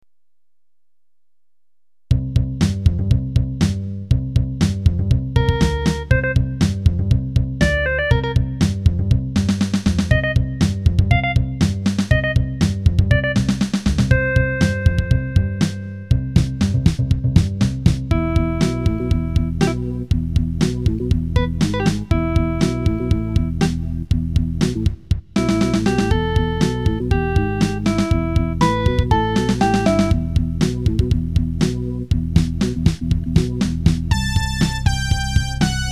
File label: Bass surround